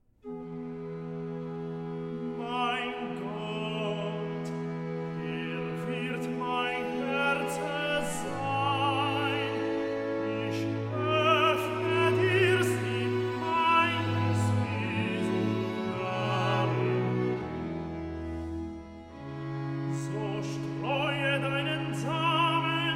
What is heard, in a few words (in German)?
Choral und Rezitativ; Suzuki